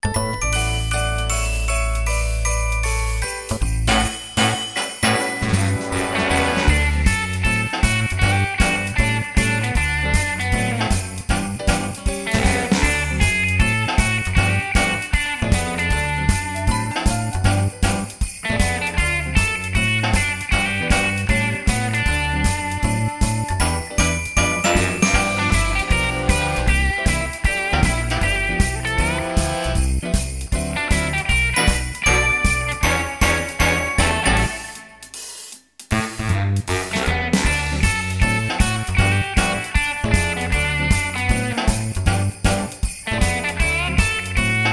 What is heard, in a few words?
Swing Them Bells